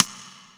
rim; Greytsounds VOL-1

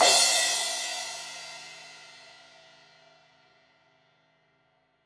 Crash 16in edge, Cymbals; Deeply Sampled Drums

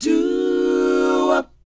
DOWOP G#4, Vocal Planet, R&B & Dance, DOO WOP; ILIO

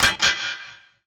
Rollers, Industrial Hits; Antidote Advent

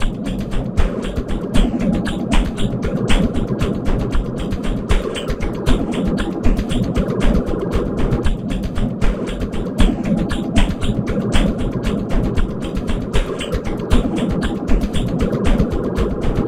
LAVA STREAMS, SLOW MELTING GROOVES

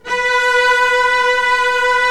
Vcs2 f vb; Roland LCDP13 String Sections; STR Vcs2 f vbx5

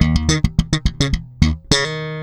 Eb, Bass, 96 BPM; Ultra Freakin' Bass; Sampleheads

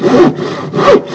SAW; CMI Sound Library Rev 1.3

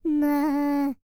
PERSIAN, World Voices; ILIO; Vocal Planet VOL-5

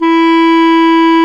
Clarinets

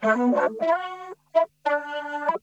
Funk Guitar; 90 s Sample CDs; Zero G

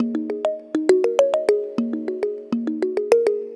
Tongue Mallet; Melodic; Ambient-Melodics-II

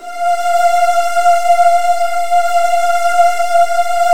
F2, Virtuoso Strings; ILIO; Kirk Hunter Virtuoso Strings VOL-1

